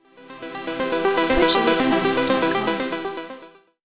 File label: Synthwave Donation